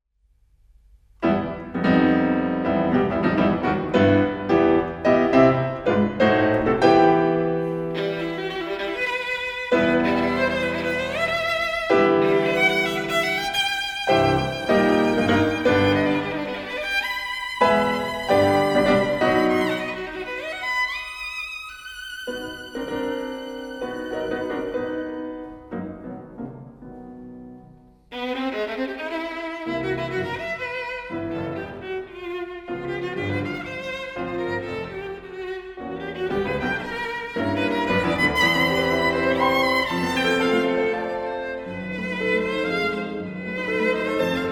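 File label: violin sonata; thuille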